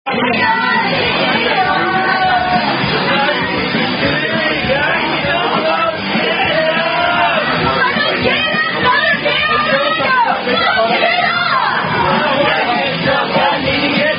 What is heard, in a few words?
Sing A Long To Drinking Song